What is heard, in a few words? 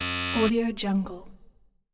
the access denied